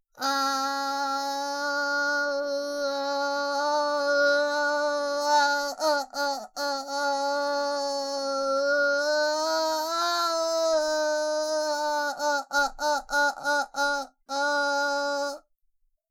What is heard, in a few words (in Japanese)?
あーーーーーーー; バイノーラル